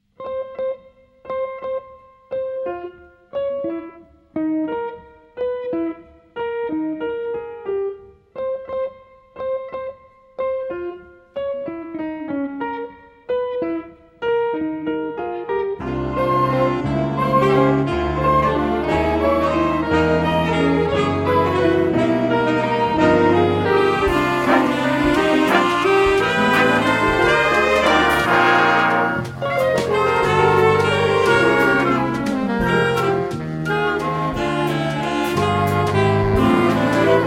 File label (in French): valse